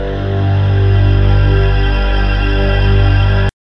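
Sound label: VOYAGER C3; ensoniq; sy77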